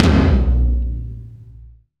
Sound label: Tom, Gated Reverb; musicradar